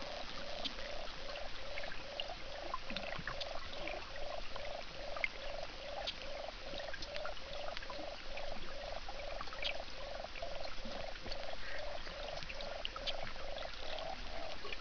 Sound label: sonar like biological